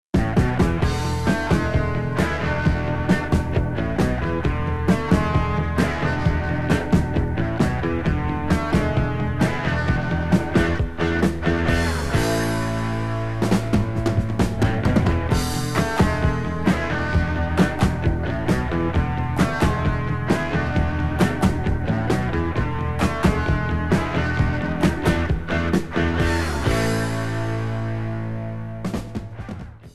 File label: Underwater Fantasy